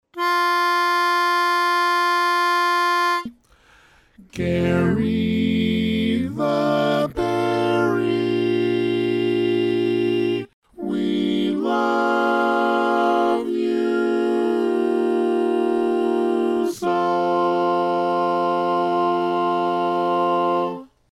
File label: Lead, barbershop tags